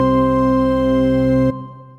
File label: ACME Organ, instruments broken keys; Ableton; Factory Packs; Mood Reel